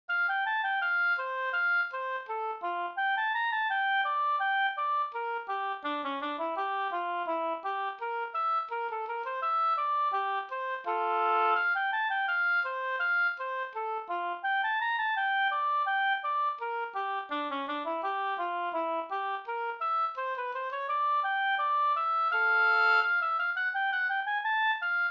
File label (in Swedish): brudmarsch; av Millerste-Kal; Osteraker